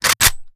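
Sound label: foley assault rifle akilo47 drum reload empty fast chamber; weapons; viper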